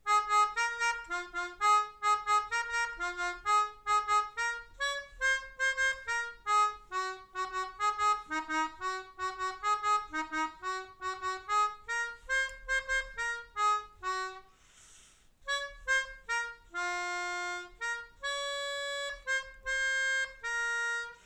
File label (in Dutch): Melodica